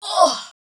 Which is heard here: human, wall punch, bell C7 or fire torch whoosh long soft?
human